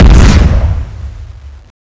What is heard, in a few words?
cannon, giant titan